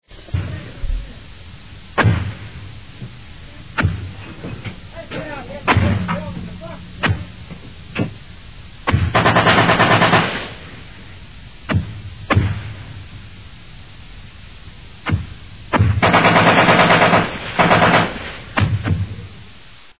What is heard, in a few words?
m60 ground